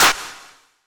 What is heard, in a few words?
Ameri EDR Clap; Yamaha PSR E305